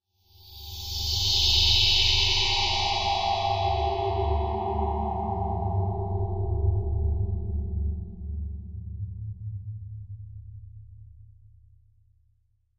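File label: Wash Mystical HF Elect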